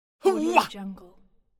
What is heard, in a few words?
martial arts fight grunt